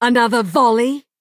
sharpshooter scout attack

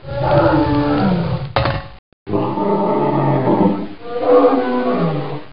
Atronach

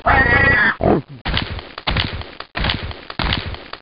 Wereboar

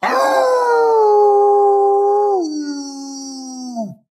Werewolf emote

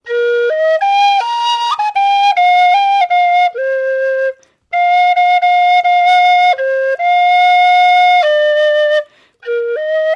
Flute; Book of Life

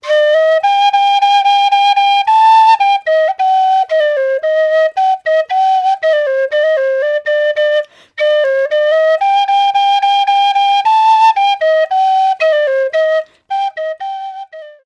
Flute Sarba sample

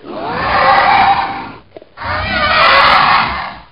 Dragonling

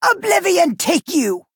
attack; hist speaker

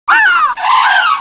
Harpy

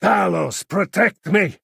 Angry villager attack

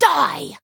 attack; bosmer recruit

